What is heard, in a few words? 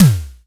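Simmons snare drum 2; KICK + SNARE; Best Service; Hyper Dance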